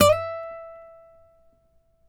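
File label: nylon, FLAMENCO, Guitars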